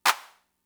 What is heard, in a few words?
Clap; Fricke MFB-522